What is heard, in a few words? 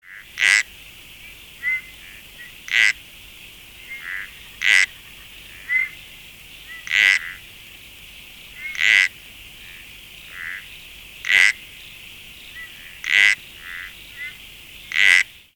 tyleri, frogs, uperoleia